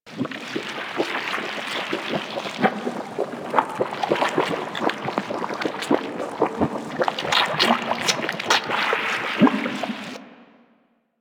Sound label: Interior Caves Vein Loop; Warframe; Infested Eris; Ambience; Props Indoor